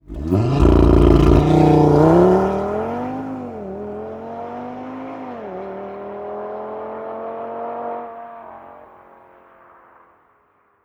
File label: Audi RS3 alt ohne ASPP drive off fast; audi sport